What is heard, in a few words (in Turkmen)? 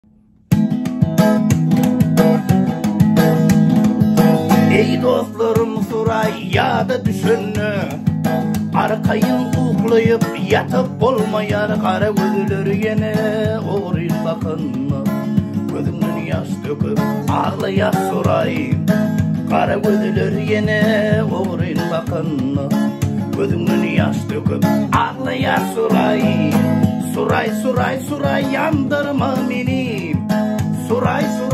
turkmen gitara